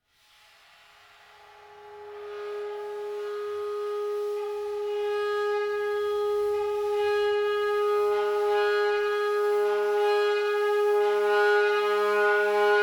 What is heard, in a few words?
double octave, bowed cymbals